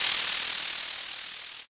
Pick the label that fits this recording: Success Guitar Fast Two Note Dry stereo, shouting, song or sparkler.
sparkler